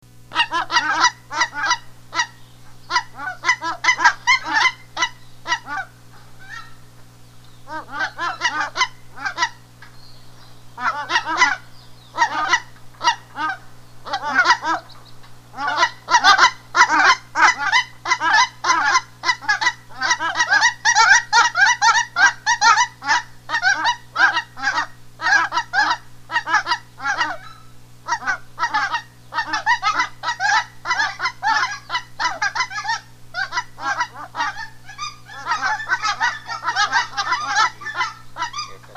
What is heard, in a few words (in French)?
bernache de hutchins, chant